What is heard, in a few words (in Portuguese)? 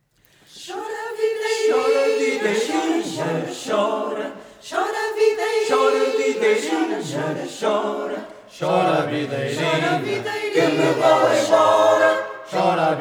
coro polifonico; vila nova de cerveira; cantares tradicionais